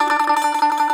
BAGLAMA T0ZR; E-MU Producer Series Vol. 7 – Old World Instruments

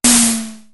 Snare, Drumfire DF-500

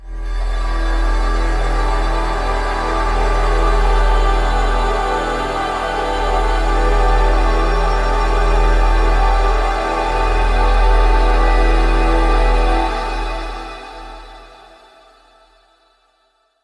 CINEMATIC PAD

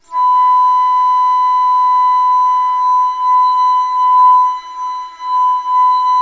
ALTO B4, ALTO FLUTE; Historical Instruments; AKAI; USB Soundscan vol.23

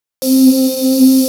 VOCAL 130BPM delay; SONIC ELEMNT; SONIC EL YES

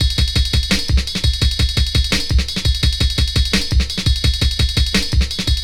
Drum Break, Drum & Bass; Fluid Dynamics

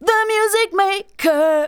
vocals sung; Techno Trance Essentials

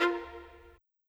Violin; Sonic Foundry (Sony Creative Software); Orchestral VOL-2; Modern 09

